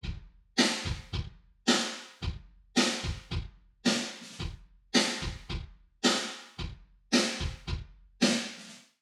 kick snare wet

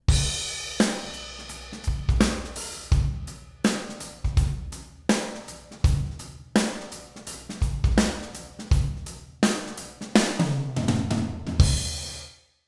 drum mix, ensemble reverb

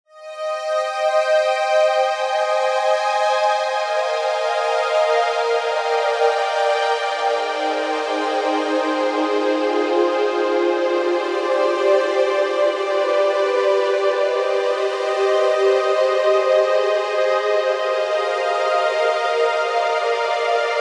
synth mix

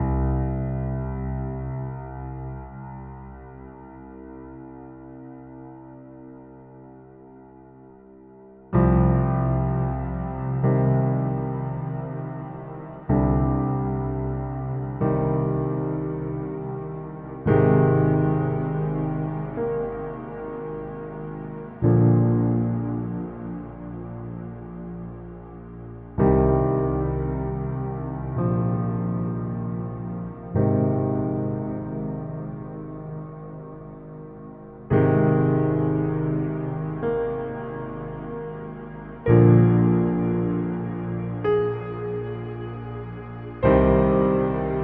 piano mix

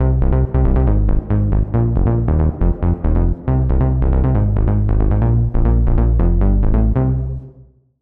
synth bass mix

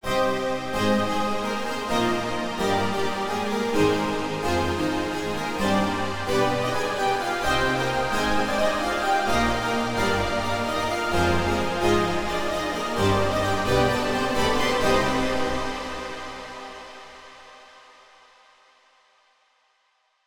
synth wet

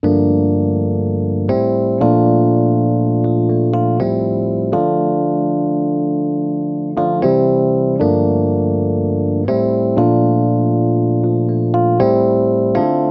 epiano dry

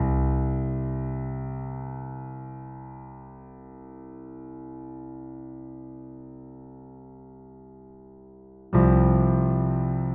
piano dry

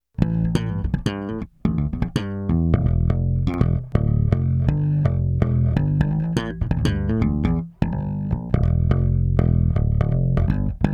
Groove Bass Dry

